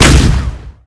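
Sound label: explosion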